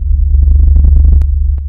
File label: trampoline idle; STALKER; somber anomaly